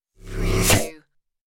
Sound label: Magnet Attract Metal